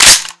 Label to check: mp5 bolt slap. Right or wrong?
Right